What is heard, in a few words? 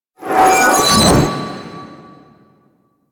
Glass Shank Sweep; DE; Warframe